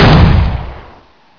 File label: svdex shoot